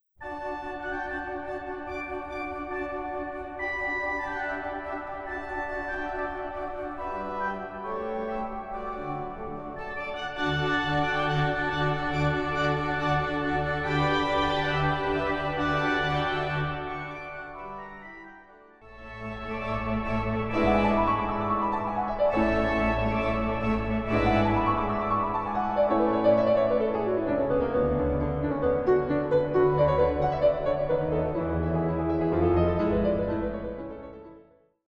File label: Keyboard concerto Vivace